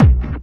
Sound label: hard bass drumz